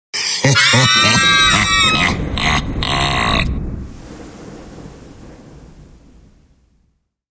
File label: Sinister evil male laugh followed by female screams and thunder; Evil Laughs; Evil Laugh (With Screams)